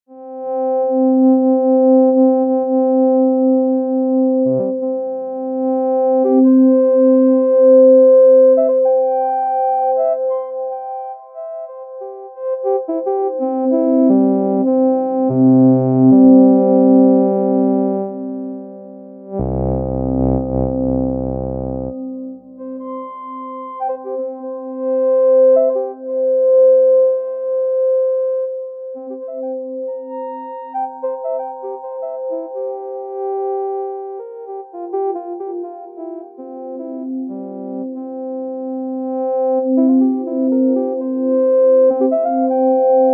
French Horn

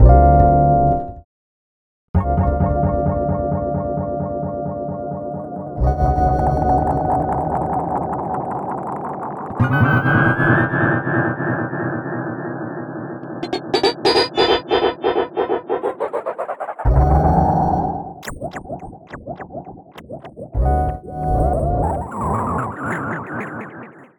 Grain Delay; MASCHINE STUDIO

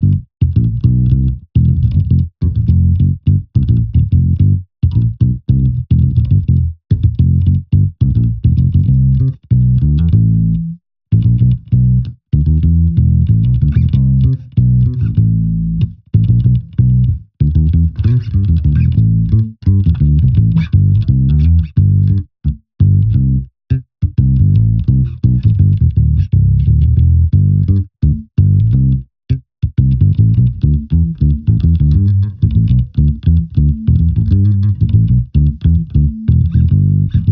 Boogie Bass; Wave Alchemy

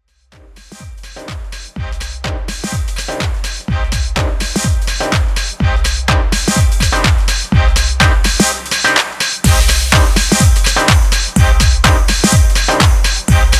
House raw synth drum; MASCHINE 2.0; Bar Upstairs

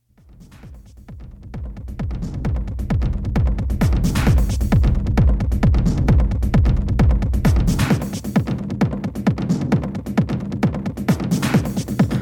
FX Delay; TRAKTOR PRO 2; traktor scratch a10

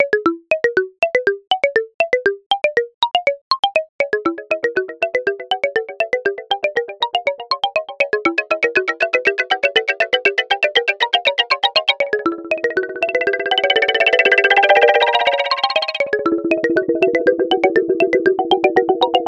beatdelay clave; maschine mikro

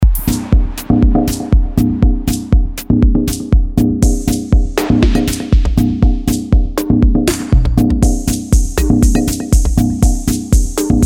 Drumsynth Kit EDM Edrums; MASCHINE 2.0; Medley 1

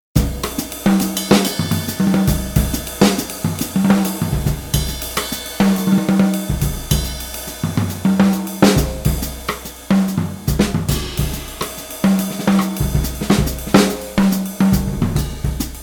Modern Jazz

